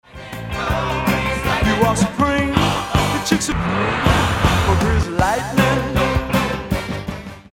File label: dirty new